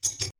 Futuristic Weapons Sound Effects

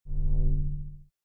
space shield sounds

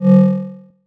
force Field

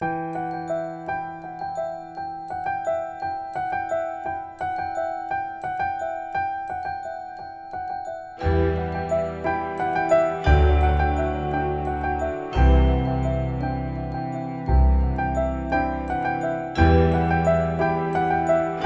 Carol of the Bells, Classical

